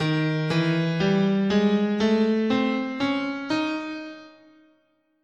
Eb Oriental, scale

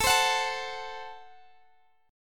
A Minor 6th A, Guitar, Open G

